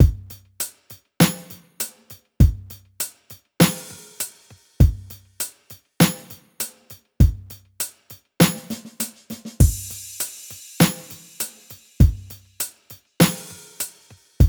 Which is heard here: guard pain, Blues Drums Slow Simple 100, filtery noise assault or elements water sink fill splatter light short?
Blues Drums Slow Simple 100